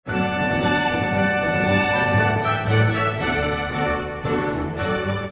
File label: P4 End Bach Gavotte Orch Suite